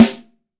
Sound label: METAL, LUDWIG SNARE